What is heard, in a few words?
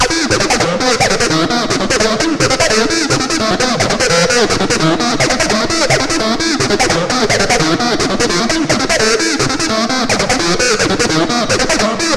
Huge Lead; abx3 trance presets vol2